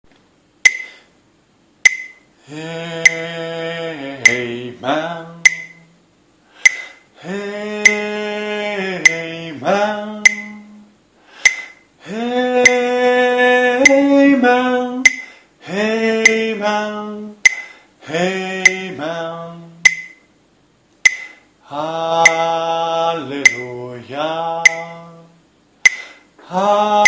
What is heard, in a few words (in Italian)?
amen soprani, coro